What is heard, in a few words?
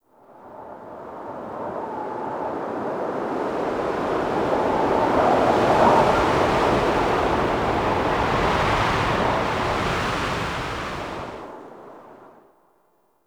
Exterior Wind Gust; Ambience; Duviri